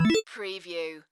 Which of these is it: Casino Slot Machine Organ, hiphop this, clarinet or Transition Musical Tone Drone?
Casino Slot Machine Organ